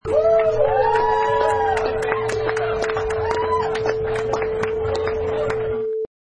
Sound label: Bar Crowd Clapping